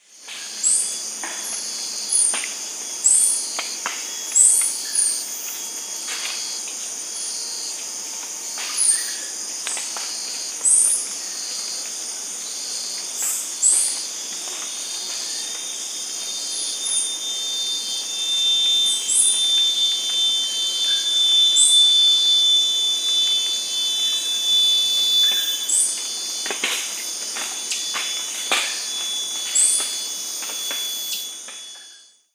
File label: bird